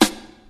acoustic snare sample d sharp key; snare drum samples

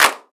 studio clap, c sharp key; Duration 0:01; Bitdepth 24

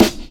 clear steel snare drum sound c sharp key

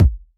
good kick sample G sharp key

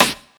noisy acoustic snare sound c key

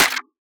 sharp trap clap single shot f key; single hits one shots

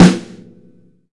full acoustic snare sample F sharp key

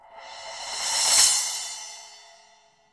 crash cymbal sound a key; cymbal drum samples

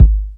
smooth kick sound g sharp key; Duration 0:01

